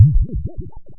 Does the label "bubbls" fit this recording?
Yes